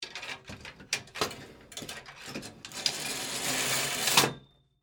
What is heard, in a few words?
Hangers Movement, Household, Hangers, Movement, Slide, Hotel, Closet